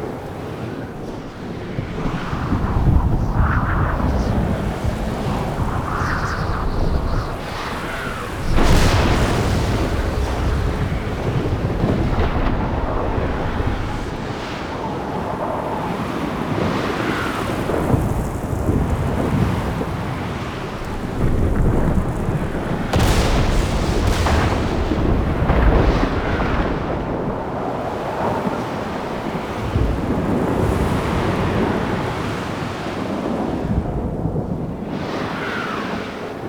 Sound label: Loading Screen Background Amb Loop; Warframe; Duviri Murmur; Ambience; Exterior